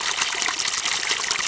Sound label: fountain; water; Tony Hawk's Project 8; XBOX